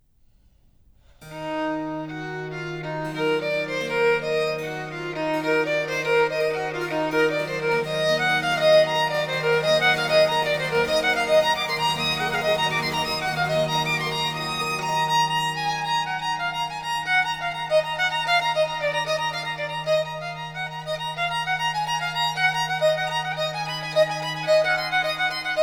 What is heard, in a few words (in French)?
Sonate pour violon & basse continue en mi mineur; BWV 1023; Bach Earthly and Divine; La Chair et l'esprit; ALPHA